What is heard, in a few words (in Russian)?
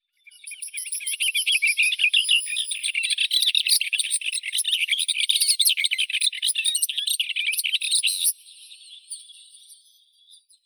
bormotushka severnaya hippolais caligata